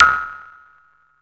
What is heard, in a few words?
KWERK BLIP; Zero-G Ambient 1; ANABELL PERC